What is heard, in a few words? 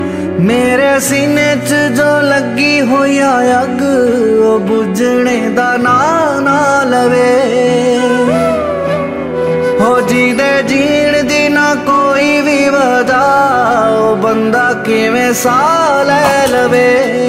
Sad Punjabi Song Ringtone